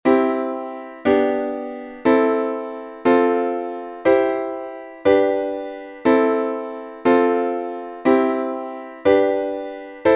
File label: 4 chors 4 times; musicologia